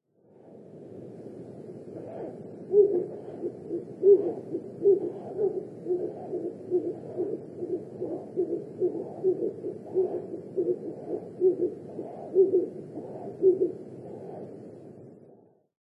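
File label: Ural Owl, nikko; koe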